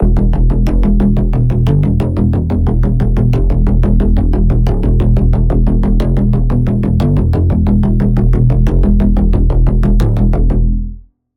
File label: Pulse